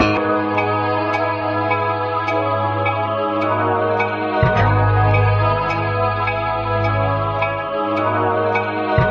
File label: keys sad funk, Sad Loops